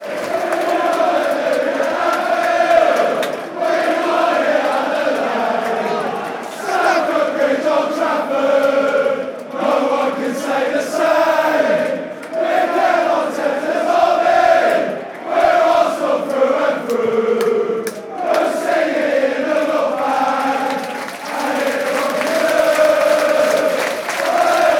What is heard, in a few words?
allez allez allez, chants